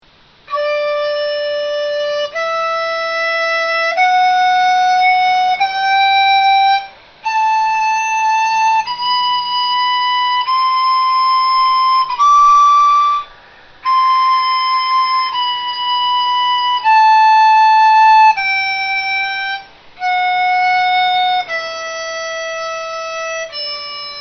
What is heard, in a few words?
dizi